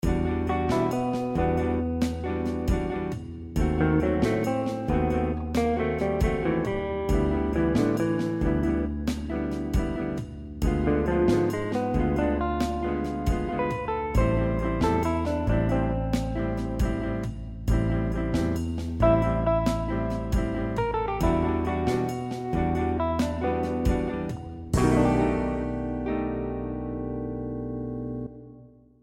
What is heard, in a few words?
Minor Jazz